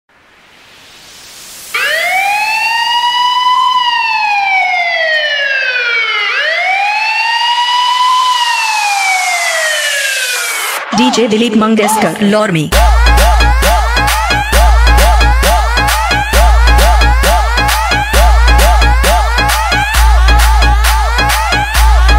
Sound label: Remix Police Siren